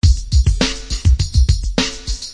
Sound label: lotsa kicks rap, 103 bpm, drum loop; 101 to 110 bpm